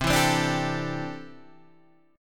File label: C 6th Add 9th C down Guitar DADGAD